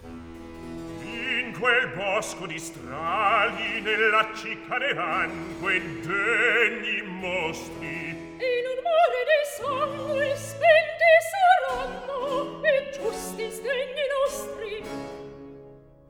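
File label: Recitativo, Handel opera; Act 3; In quel bosco si strali; Handel - Rinaldo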